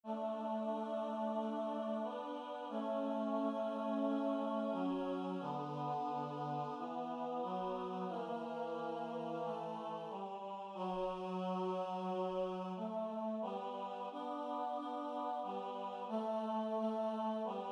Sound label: Tutti